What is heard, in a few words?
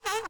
Cartoon Squeak